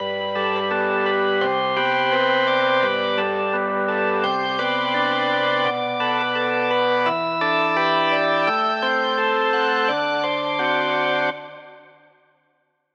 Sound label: Hybrid Advanced Gospel; midi wizard 2